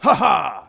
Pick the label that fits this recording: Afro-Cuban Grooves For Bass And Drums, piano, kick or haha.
haha